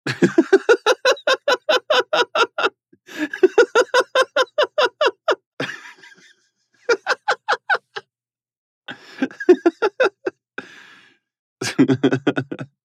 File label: man laughs